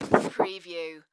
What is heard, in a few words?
Poster Board Pick Up; sound-effects-bible